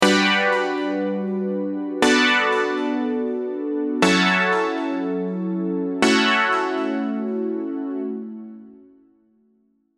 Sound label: vital presets; chiqui audio; vital presets pack